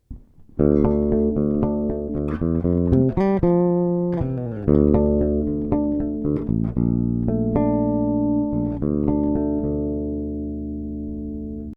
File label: Marleaux Bridge PU single coil